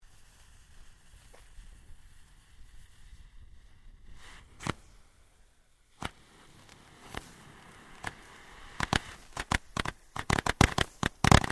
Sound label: Fireworks Multi Launcher, Variety of fireworks being detonated